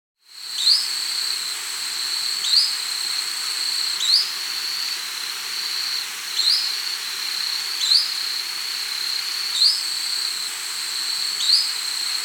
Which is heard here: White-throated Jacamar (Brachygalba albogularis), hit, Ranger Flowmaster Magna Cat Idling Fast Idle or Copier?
White-throated Jacamar (Brachygalba albogularis)